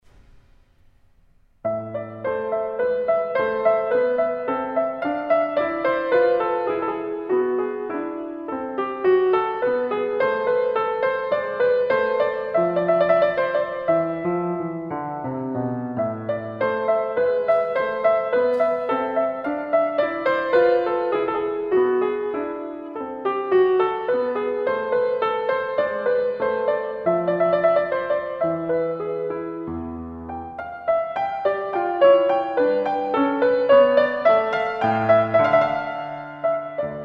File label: Menuet; JP Rameau